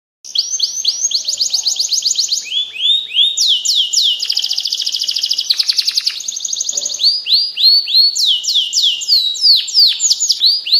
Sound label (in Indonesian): suara kicauan kenari standar